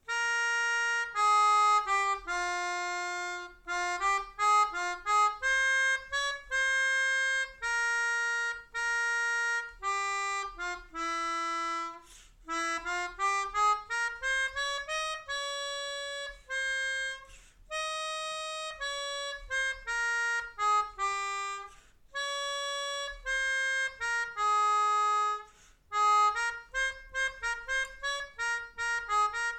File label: Melodica